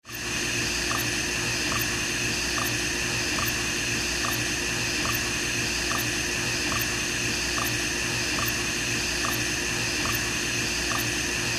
HOSPITAL EQUIPMENT HOSPITAL: Intensive care unit, ventilator hiss, clean.